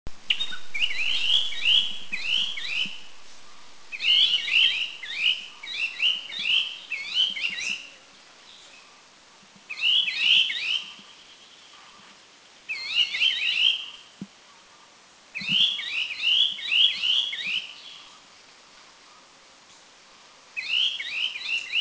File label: Striated Bulbul, Doi Lang, Thailand